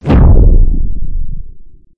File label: wearoff